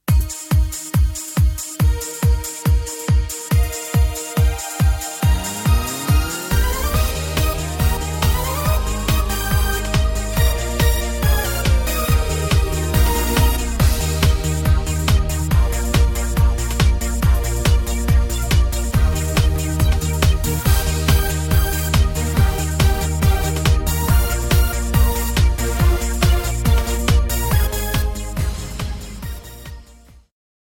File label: Hello Hello Karaoke